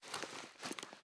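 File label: submachine gun reload empty fast start; gmod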